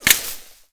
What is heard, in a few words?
impact foliage; Modern Warfare